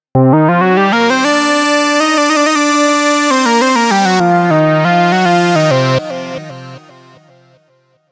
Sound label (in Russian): Moogman, сэмпл синтезатора в стиле funk